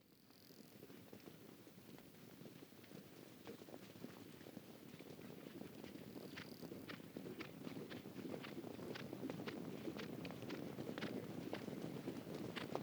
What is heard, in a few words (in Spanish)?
TROPILLA de CABALLOS pasan